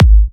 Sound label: Kicks G#; markus hakala